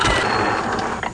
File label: minigun end; Soldat